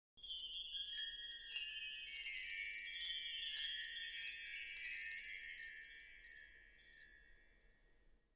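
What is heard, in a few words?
wind chime random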